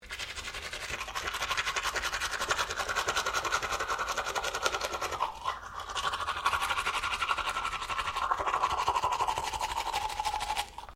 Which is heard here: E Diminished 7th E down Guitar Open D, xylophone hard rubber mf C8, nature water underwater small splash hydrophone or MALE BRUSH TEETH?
MALE BRUSH TEETH